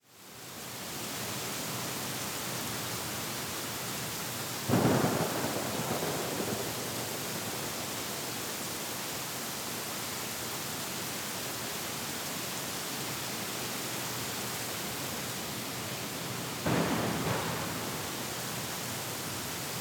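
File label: Ambience rain; game builder garage 2021 switch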